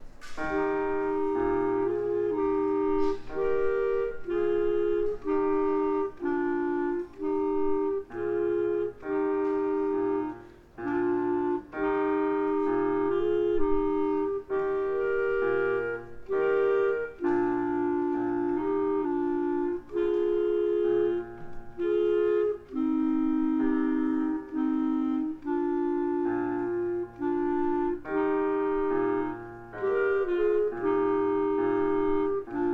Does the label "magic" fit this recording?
No